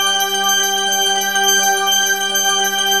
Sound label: SYNTH CARILN